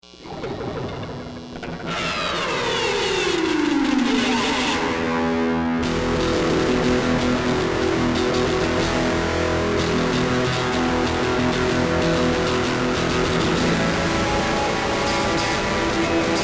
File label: electric space intro